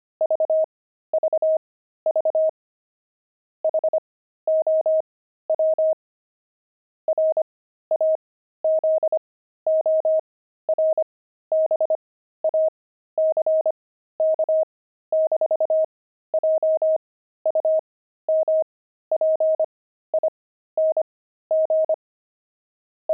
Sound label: pangram frogs; Intermediate Pangrams 25cpm